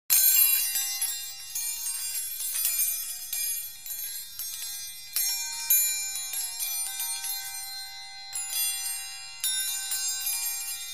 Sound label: Pond Bells Multitone, bells